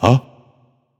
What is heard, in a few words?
notice; danzaburou